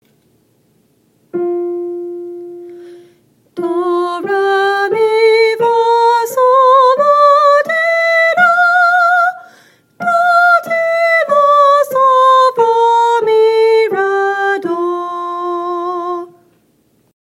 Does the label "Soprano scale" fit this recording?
Yes